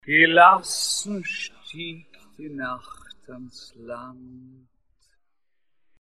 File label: squeeze FFT